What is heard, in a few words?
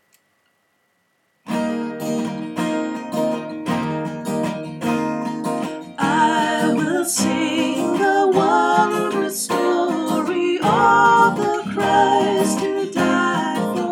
tutti